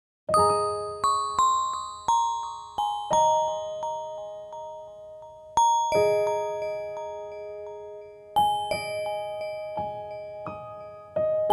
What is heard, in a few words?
Peaceful Bell, 차분 평화로운